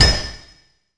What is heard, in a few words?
Lay rail; interface